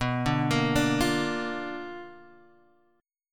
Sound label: Bb Major B, chord; Bb/B arpeggiated; JGuitar